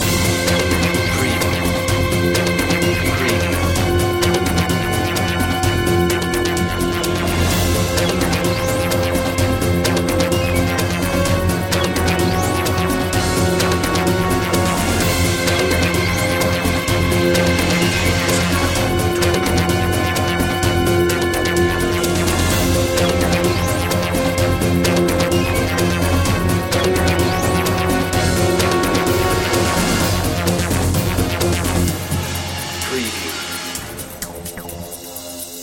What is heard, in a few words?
digital voodoo loop